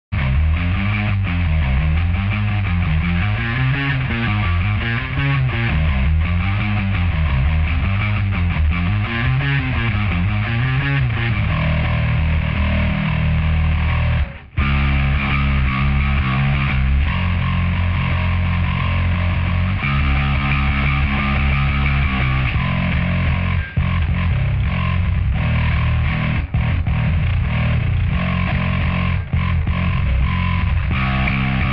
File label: bass big cab processed